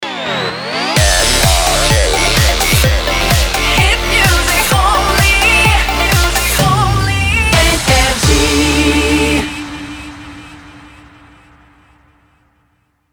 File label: Jingle Shotgun; NRJ